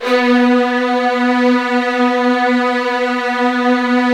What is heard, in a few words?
SB1, Virtuoso Strings